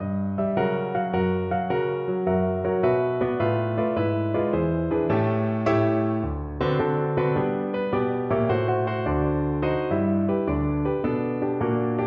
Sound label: Double Bass